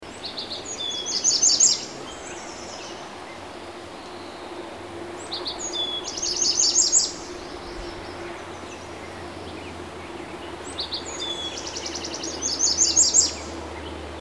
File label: American Redstart, Setophaga ruticilla; warblers